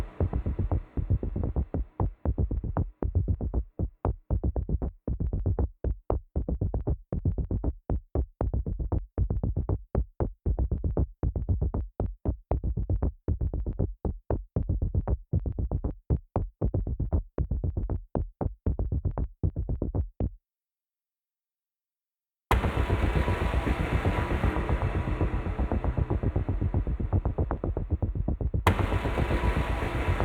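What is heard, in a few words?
Heavy Blades Bass; Grineer; Grineer One B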